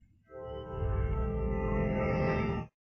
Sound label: horror suspense